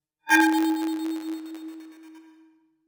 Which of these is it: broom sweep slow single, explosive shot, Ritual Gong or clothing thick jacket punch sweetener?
Ritual Gong